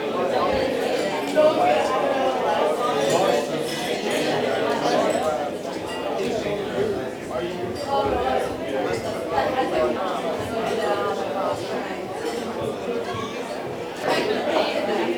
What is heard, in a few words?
Diner Amb Mono